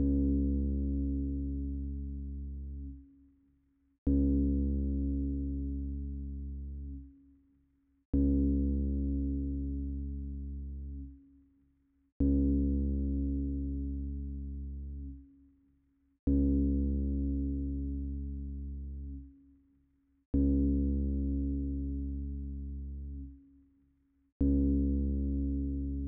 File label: 118 BPM C# Min Guitar, melody loop stem; Trap; Loop Stems; Cymatics; 2022 Melody Collection - Trap Pt 2; Last Fight